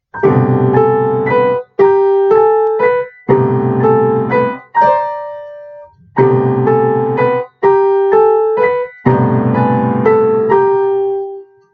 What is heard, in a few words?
fire truck